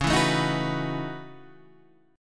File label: Gb Minor 13th Db